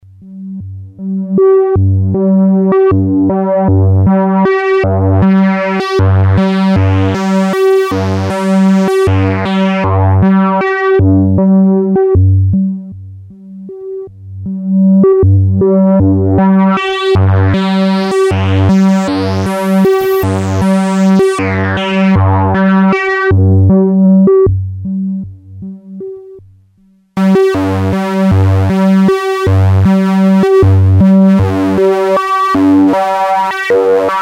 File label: filter